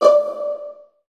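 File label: viola pizzicato D2 right, Virtuoso Strings; ILIO; Kirk Hunter Virtuoso Strings VOL-2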